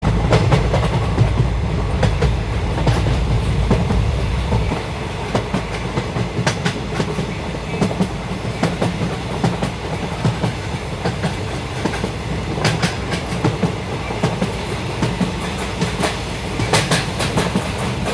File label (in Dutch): Trein Rijden